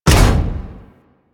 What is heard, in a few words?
Jump Landing; Warframe; Corpus; Arachnoid Hunger; Steps